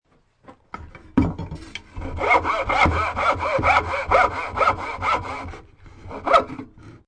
Handsaw Short, A high quality up close short stereo recording of sawing wood.; Hand Tools